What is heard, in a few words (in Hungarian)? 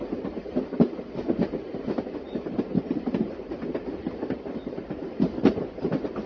Fehérzaj; relax compact hang es dallamterapias feher zaj keszulek